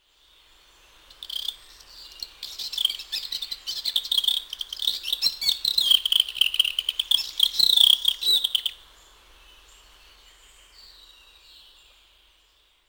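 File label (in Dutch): Tuinfluiter Sylvia borin, fluiten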